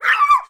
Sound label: dog yelping; Hearts of Iron IV Death or Dishonor